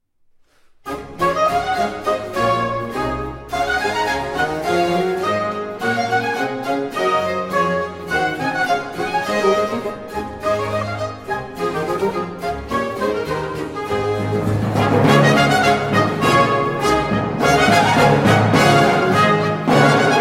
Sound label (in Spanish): Marcha; Haendel; Oratorio Ocasional